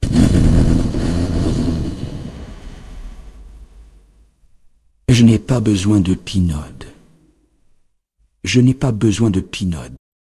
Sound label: jingle